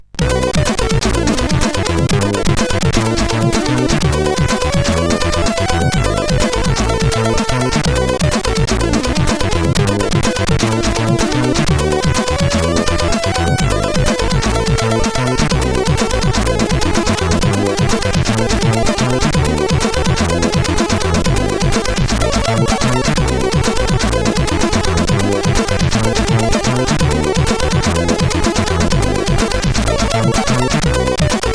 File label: Weird, CSG8580R5